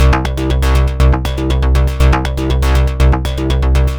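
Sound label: 120 MOD DRUM, Modular Synth Loops; Polyend; Simulacrum Sounds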